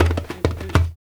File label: KAKID, African Perc; Spectrasonics; Supreme Beats; African Contemporary; Afro DRMs